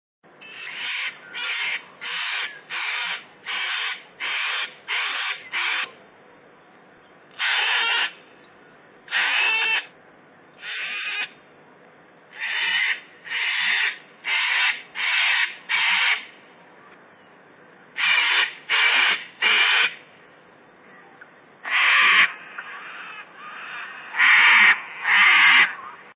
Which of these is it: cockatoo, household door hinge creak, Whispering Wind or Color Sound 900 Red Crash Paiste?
cockatoo